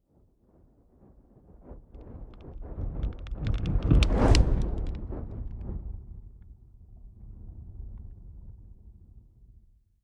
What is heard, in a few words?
fire whoosh crackling; swooshes-swishes